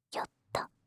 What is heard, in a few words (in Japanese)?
ヨット, ASMR; 【無声音ASMR】シャッフル睡眠法で眠ろう【バイノーラル音声】; シャッフル睡眠ランダム再生用